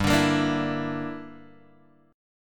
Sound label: G Minor 6th, Guitar, Open F; strummed; 7,9,10,9,7,x; JGuitar